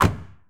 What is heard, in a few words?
Viper GTS Door Close; Automobiles